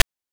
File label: PITCHED NOISE; NES DPCM; MIDINES